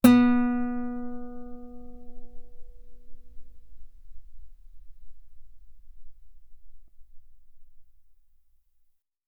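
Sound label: Guitar ff B string B3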